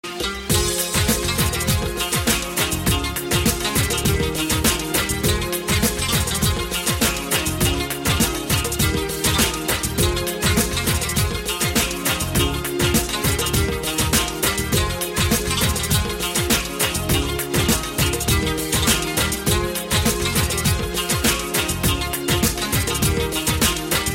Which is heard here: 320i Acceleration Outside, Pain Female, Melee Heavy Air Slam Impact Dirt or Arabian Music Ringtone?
Arabian Music Ringtone